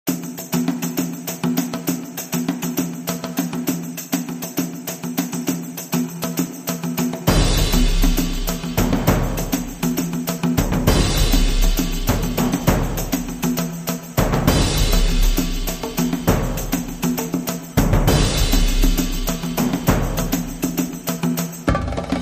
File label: Epic Percussion Adventure